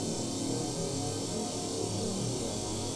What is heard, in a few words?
chainsaw idle reload, weapons